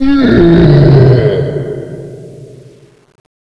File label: zombie death; passzombie